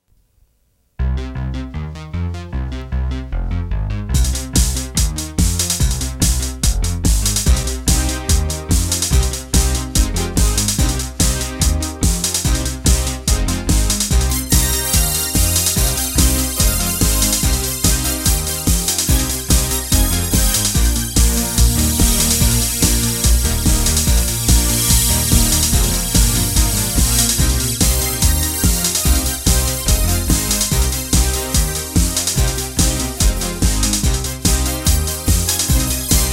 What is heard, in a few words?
Pulse